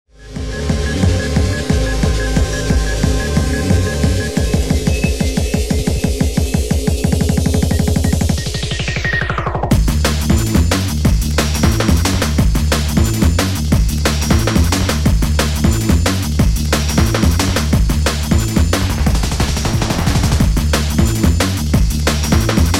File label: Jazz